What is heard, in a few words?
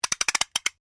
NOISEMAKER WOODEN TURN SHORT, Cheap percussion toys